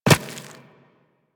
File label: Walk Rock, Footsteps; Warframe; Operator